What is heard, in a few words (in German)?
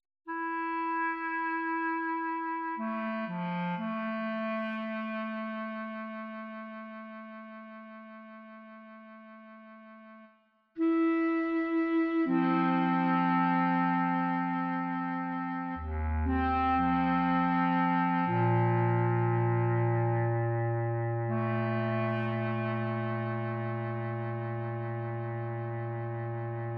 klarinettenmeditation Bassklarinetten; Algorithmische Kompositionen; kramann